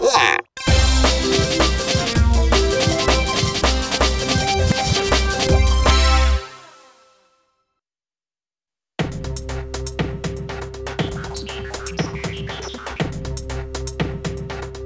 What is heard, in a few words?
Victory Theme; RoboBlitz